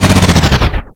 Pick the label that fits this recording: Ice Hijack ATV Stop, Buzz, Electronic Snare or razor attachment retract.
Ice Hijack ATV Stop